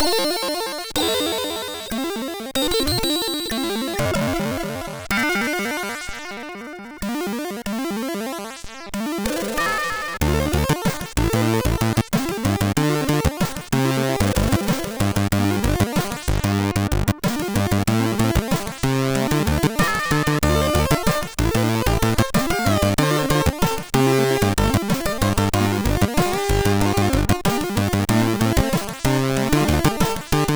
sid, MOS6581; MOS6581R3

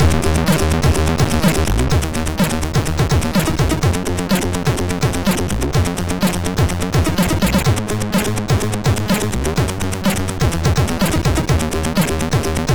Bass

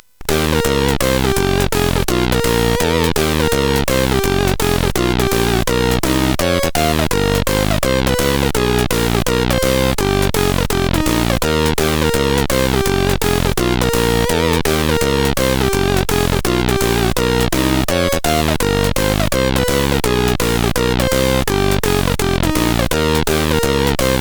Jazz, CSG8580; hvsc

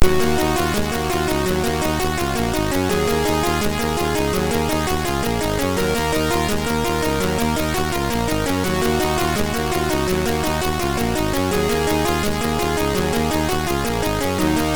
Sound Demo, sid MOS6581R2

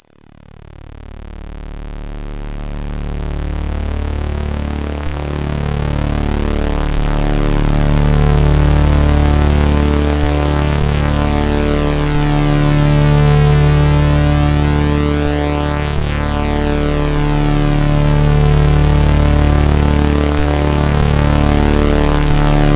Sinister Opener, sid CSG8580R5; hvsc

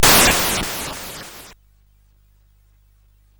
Frantic, CSG8580R5

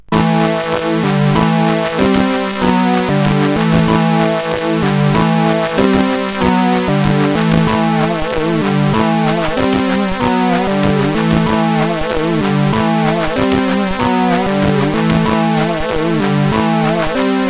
New Digi, CSG8580R5, sid

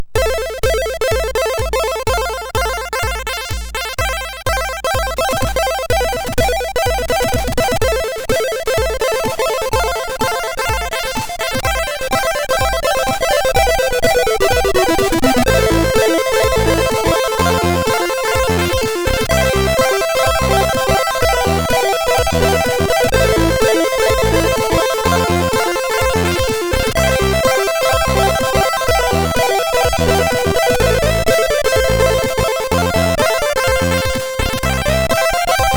Beats tune, sid MOS6581R4

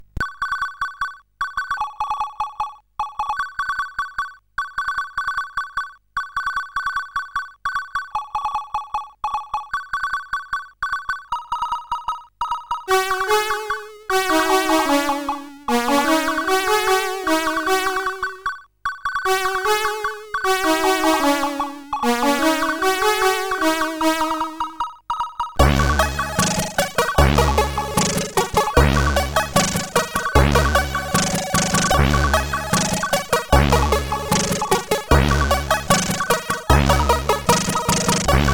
sid CSG8580R5; Last Ninja 2

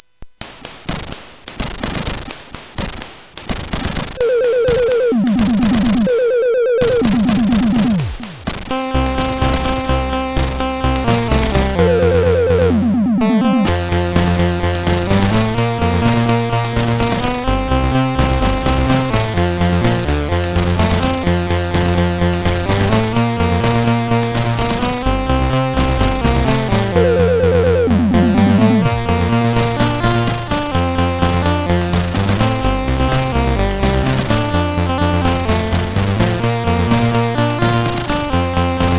Remix, CSG8580R5; hvsc

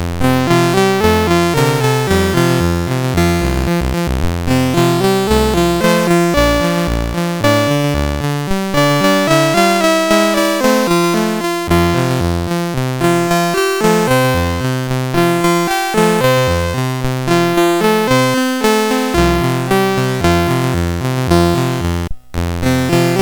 sid CSG8580R5, GAMES; Fred

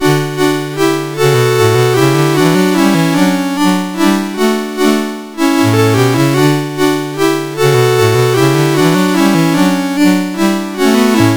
Chorale, sid CSG8580R5

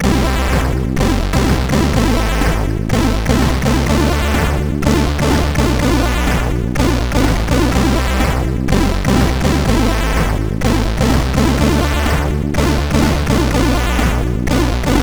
Unclean Fanfare, sid, CSG8580R5

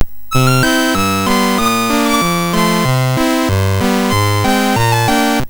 Wobbler sid MOS6581R3

